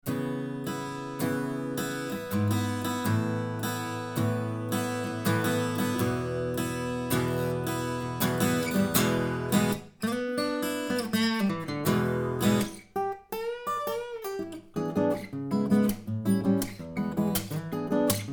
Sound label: Ac Gtr